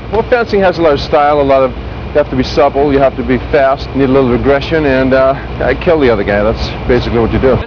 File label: FENCING